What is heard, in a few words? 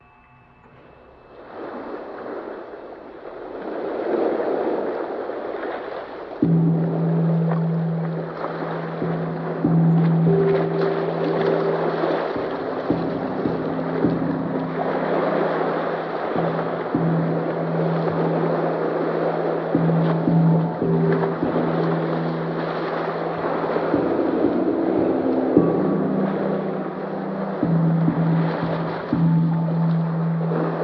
Yoga Waves & Sound